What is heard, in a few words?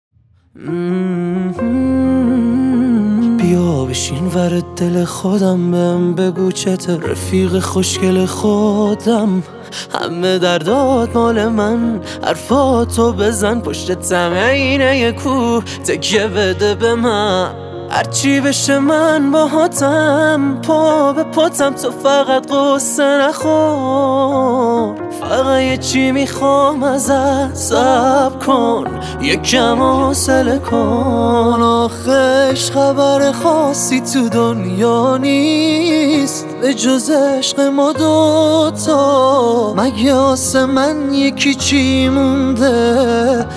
Piano Version